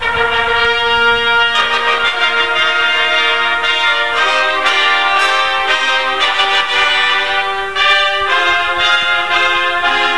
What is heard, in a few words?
Fanfare